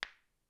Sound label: hit